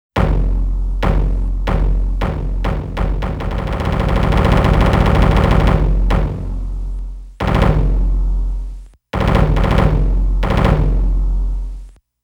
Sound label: Drum Roll